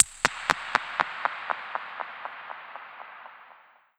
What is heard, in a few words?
Sound FX Click hat